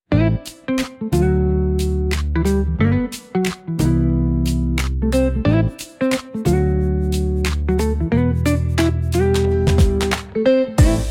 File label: Velvet Groove; Foximusic